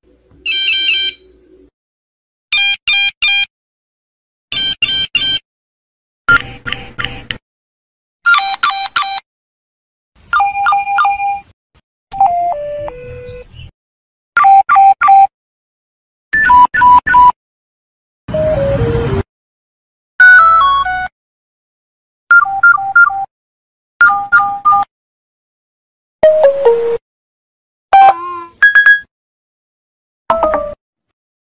nokia low battery evolution nokia ringtone ringtone